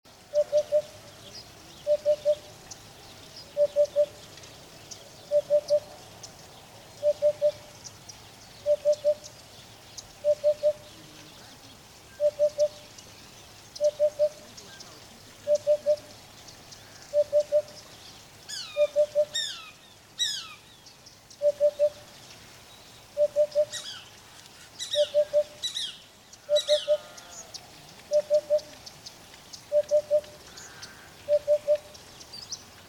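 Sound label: udod golos, hoopoe voice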